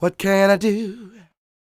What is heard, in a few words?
SING W, Vocal Planet Blues & Soul, BLUESY; ILIO